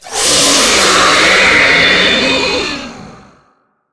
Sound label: spider dying